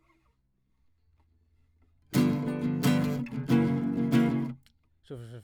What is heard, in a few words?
Classic Guitar